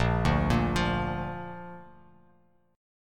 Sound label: Bb Major Bb down Bass Standard, chord; arpeggiated; JGuitar